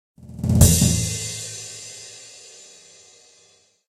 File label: win